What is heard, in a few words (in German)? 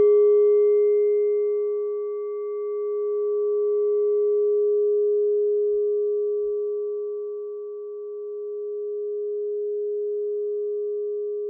klangschale nepal, Klangschalen Nepal